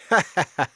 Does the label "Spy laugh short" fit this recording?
Yes